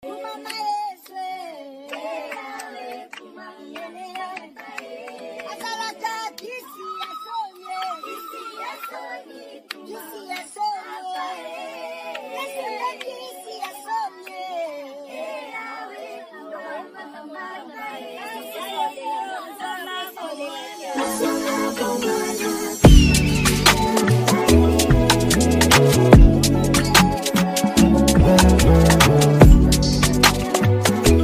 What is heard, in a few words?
drill remix